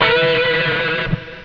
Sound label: GUITAR